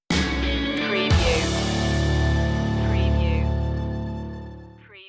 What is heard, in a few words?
stinger, Emotional and uplifting; rock; indie rock